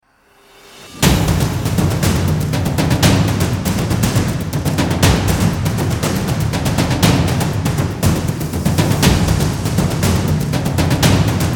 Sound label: Epic Drums